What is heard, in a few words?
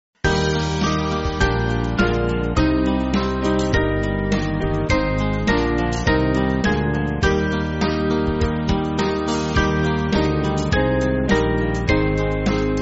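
Band, Backing Files for Churches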